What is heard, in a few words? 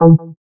exit screen, earcons; chromeos